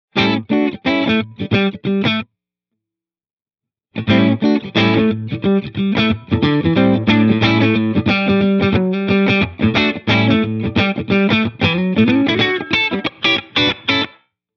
Room Sound Short, reverb

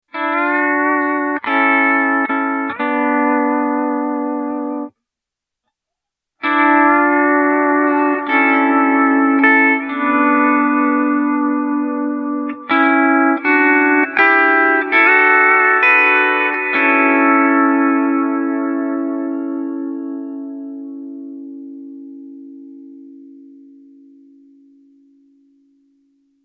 Reverse Decay Pedal Steel, reverb

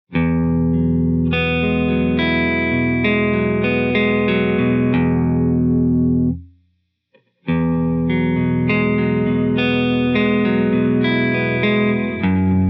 Hall Concert Medium Short Decay

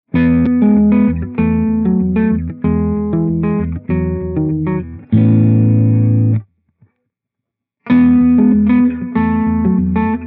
Spring Dark Gibson, reverb

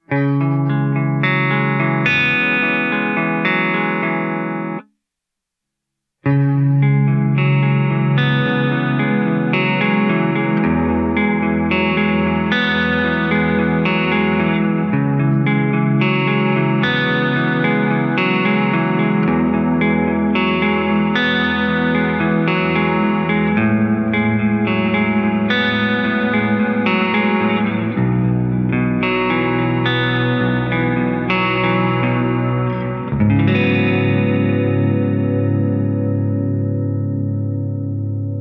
Modulation Modulated Baritone, reverb

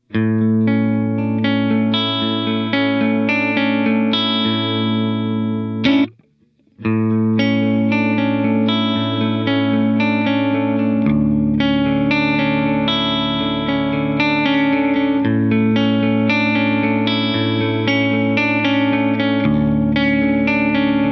Ghost Wind Short, reverb

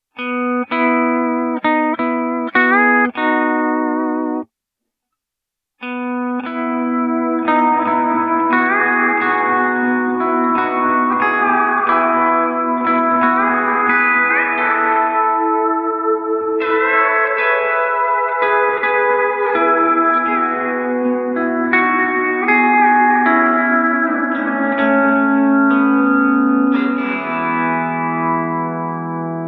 Ambient Swell Pedal Steel, reverb

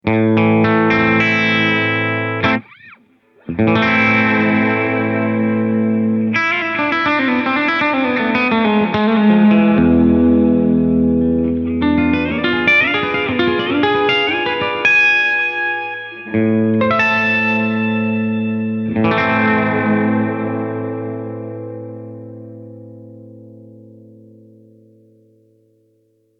Plate Studio Western, reverb

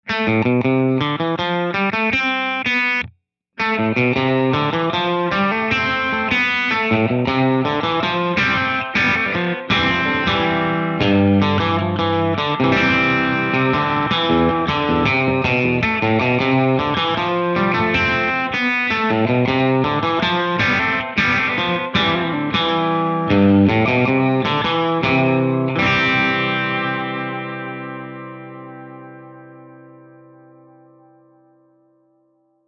Spring Overdriven Surf, reverb

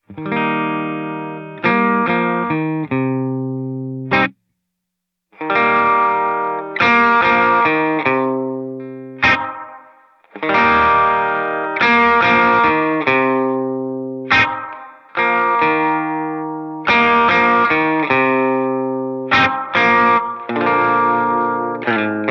LoFi 50s Radio Doo Wop, reverb

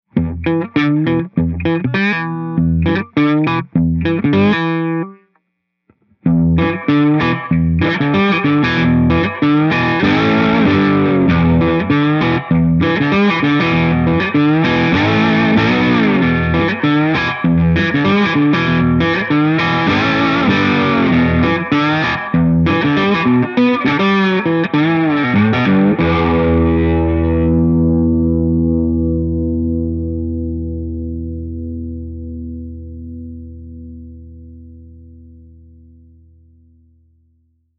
Spring Overdriven Slide, reverb

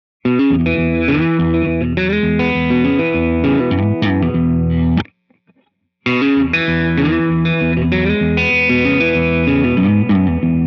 Hall Concert Gibson, reverb

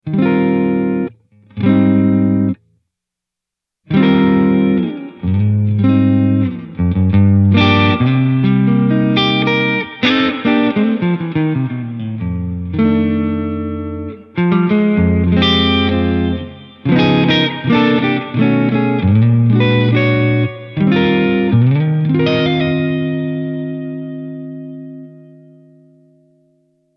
Spring Bright Long, reverb

